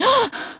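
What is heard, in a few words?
Realistic Fatigue Voice, female; oblivion; Imperial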